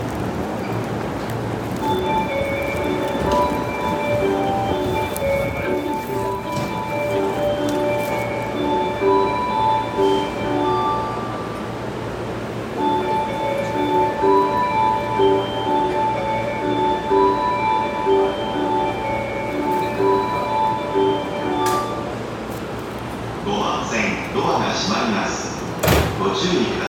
中央・総武線秋葉原西, train sounds

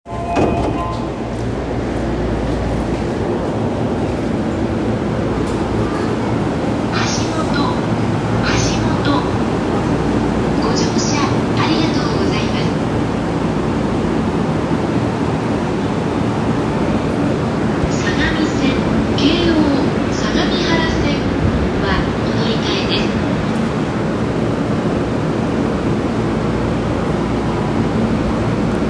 JR横浜線橋本北, train sounds

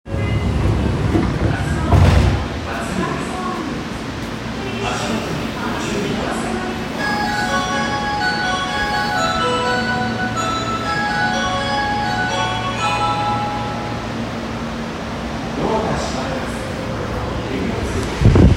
有楽町線辰巳東, train sounds

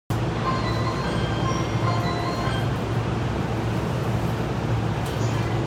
JR埼京線中浦和南, train sounds

